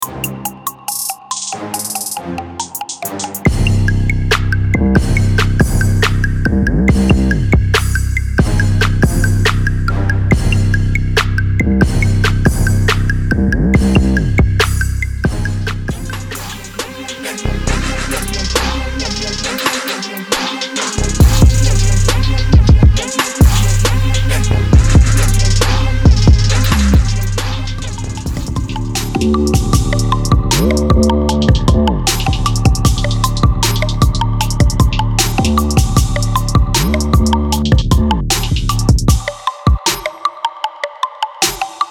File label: apocalypse trap construction kits; big fish audio; kontakt